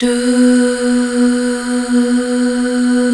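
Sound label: DUH, Female; BACK. DUUHH; Sound & Vision - Gigapack II CD 1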